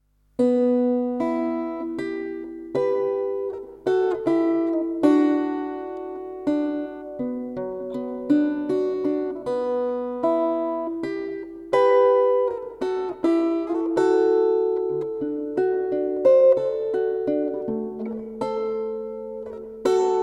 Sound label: Guitar